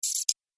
Robot Triple Swipe, sci-fi robot sound effect; Andrew Fleming; Twistedtracks

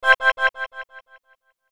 Logo Fast Synth Crescendo Delay; Sonic Logo; Blastwave; Sonic Logos; Production Element Imaging Element Accent Transition

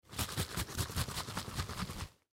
Mousse Can Shake; Hair Salon Barber Household; Primping; foley; Primping Sound Effects; Human; Blastwave

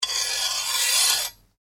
Blade Scrape Slow, Sword; Foley sounds for melee weapons; Swords; Combat

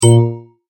multimedia tone exclamation; McKinney Sound

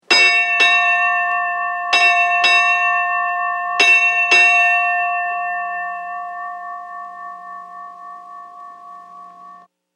Ship Bell 4 Rings; Ship Bell 6 Rings; Boats; Vehicles

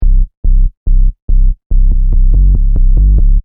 Techno Trance Sub Bass Loop In C 142 Bpm

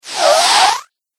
Magical Air

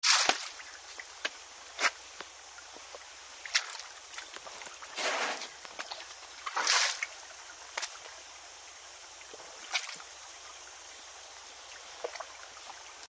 Elephant Drinking; Elephants; Animals

